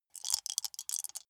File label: small four rattle in hand closed short; wuerfel